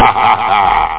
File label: laugh